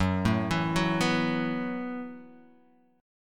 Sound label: Gb Minor 11th Gb, Guitar Half Step Down